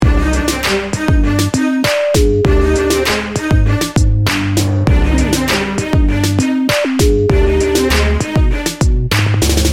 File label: hip hop heartbreak loop